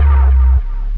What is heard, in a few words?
ECHO HIT, Synth FX Misc